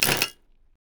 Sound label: turret; LoL; heimerdinger